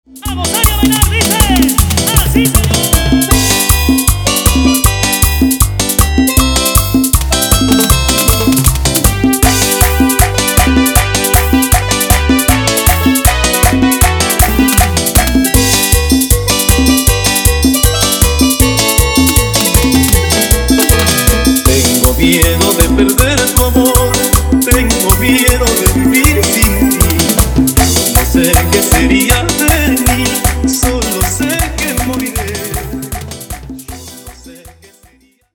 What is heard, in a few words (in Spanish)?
Instrumental Ec, Bpm 157; Dimax Dj Mix